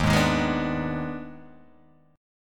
D sharp Major 7th Suspended 4th Sharp 5th, Guitar, Open G; chordshape